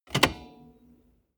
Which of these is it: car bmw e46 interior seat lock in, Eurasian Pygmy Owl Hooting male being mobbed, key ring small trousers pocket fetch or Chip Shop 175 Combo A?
car bmw e46 interior seat lock in